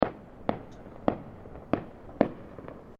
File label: Fireworks Multiple Firecrackers; Fireworks (Sound Effects:Backgrounds)